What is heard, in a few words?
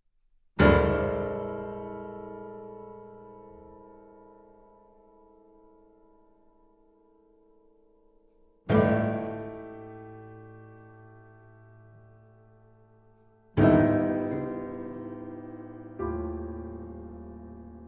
piano con forza